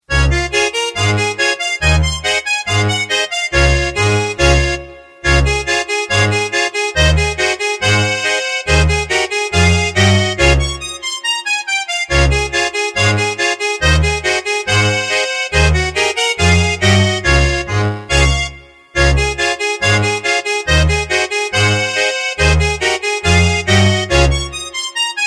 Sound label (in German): Polka